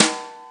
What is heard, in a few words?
Brush Ping, Drum Machines; EMU Planet-Phatt